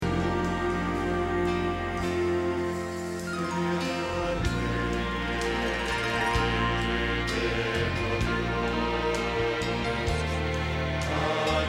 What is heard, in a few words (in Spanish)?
Bajo